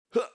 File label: jump; subwayrunner